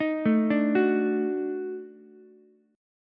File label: Eb Minor Major 7th Flat 5th Eb down Ukulele; JGuitar